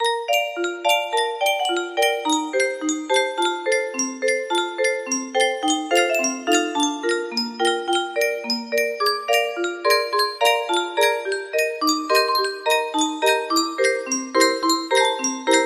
music box, music box melody

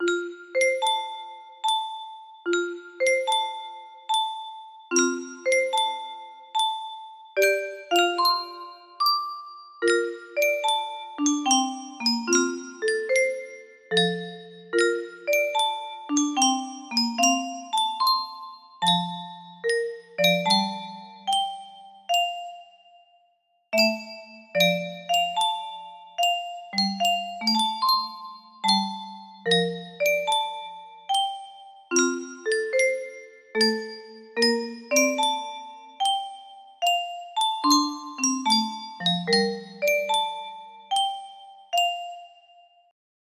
melody

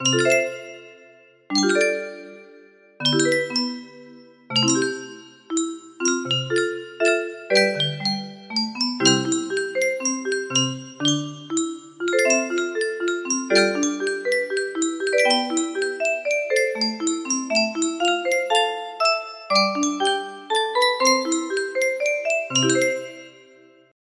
lullaby, music box melody; super mario 64

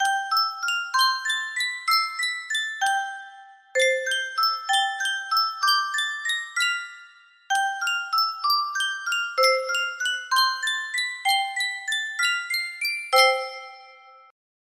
Sankyo music box, music box melody

